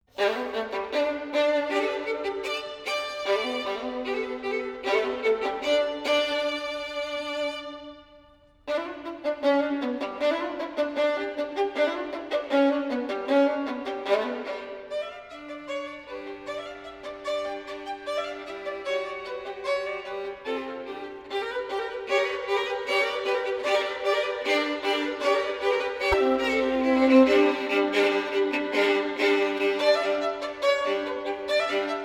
String Quartet Allegro grazioso

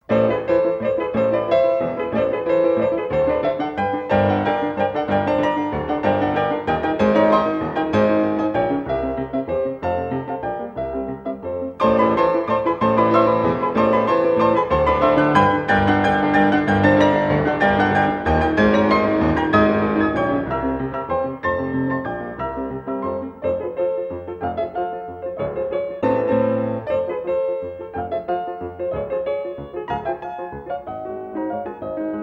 Waltzes for piano four hands in A minor

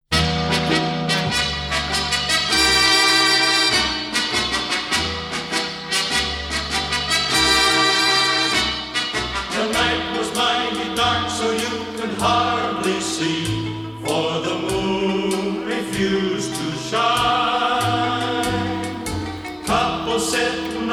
Strings Orchestra, Singers

